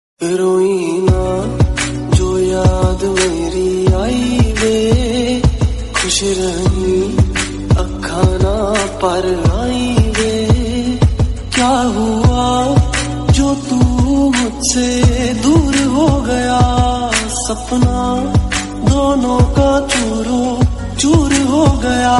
Sad Ringtone

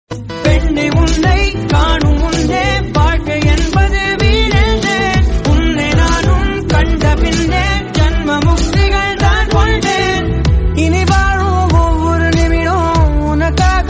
Tamil Song

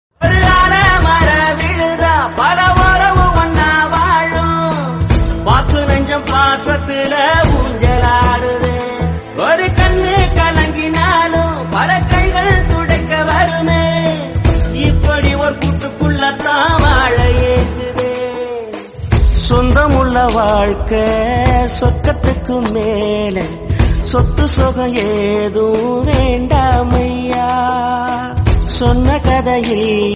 South Love

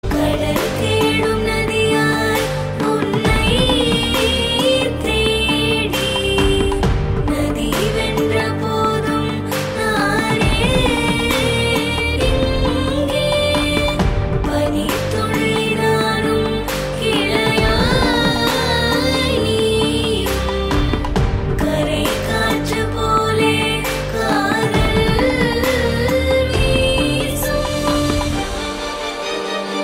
Tamil Song Ringtone

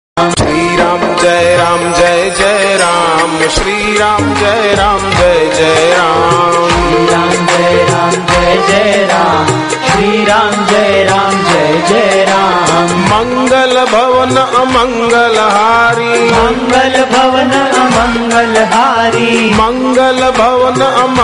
Devotional Bhajan Hindi

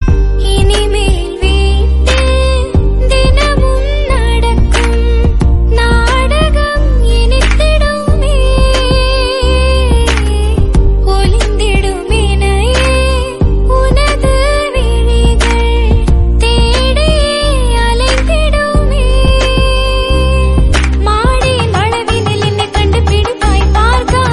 Tamil Song, ringtone; Saamy; Vikram; Trisha

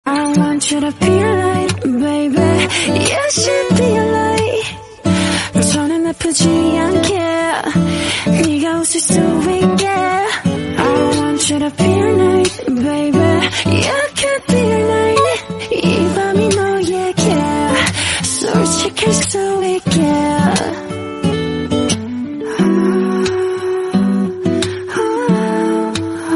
Korean Song

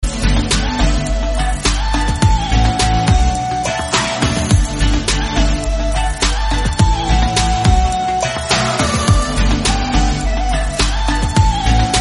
Instrumental

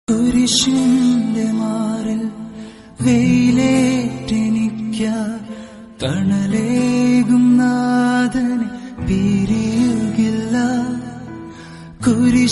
Tamil South Indian

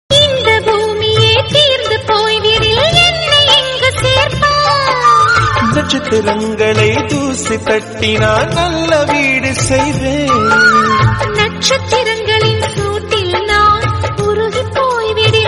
Tamil Song

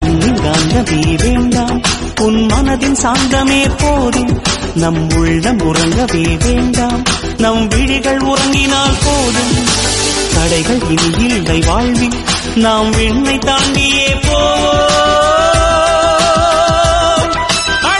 Tamil Song Ringtone

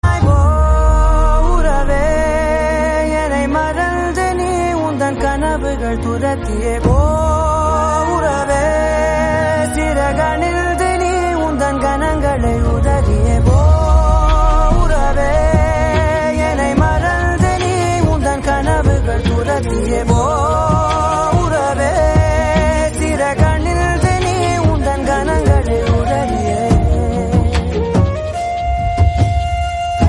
Tamil Song

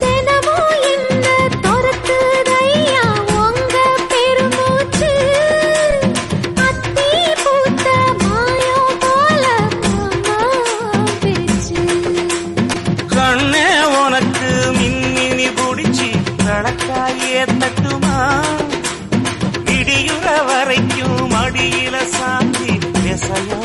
Tamil Song Ringtone; Periyanna

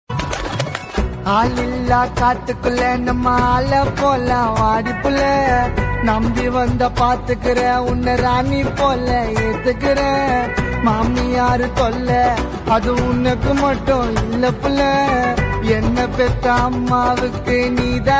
Gana Tamil Song

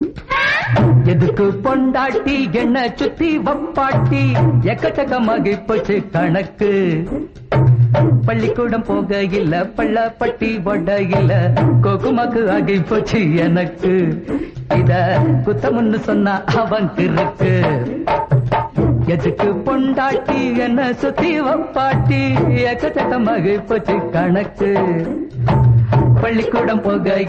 Tamil; Kizhakku Cheemayile

